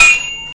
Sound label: Sword Clash